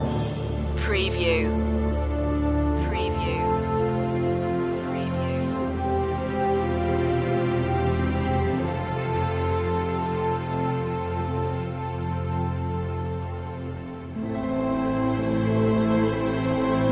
orchestral

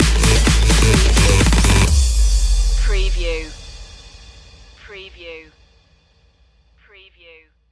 party drop stinger

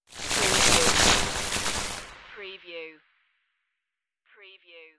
electric space glitch

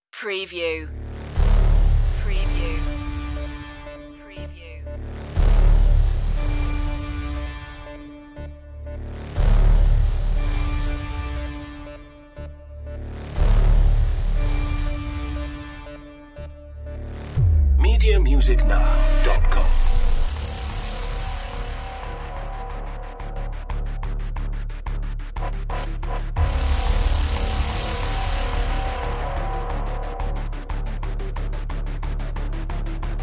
hybrid trailer synths and blares only

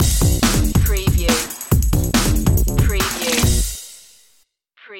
fatfunk sting

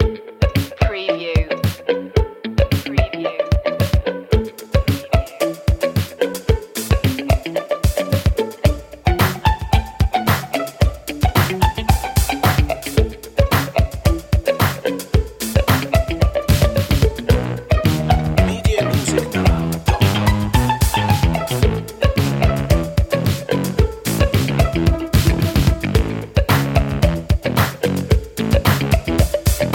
cute n fun loop

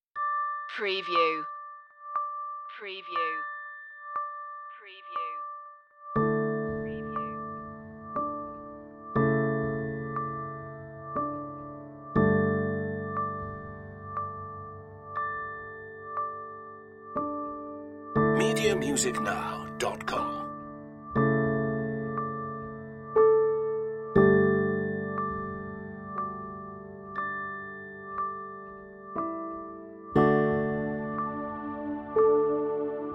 relaxation no sound fx

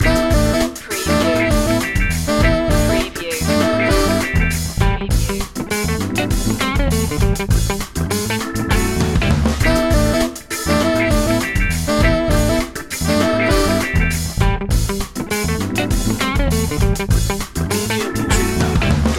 funky loop

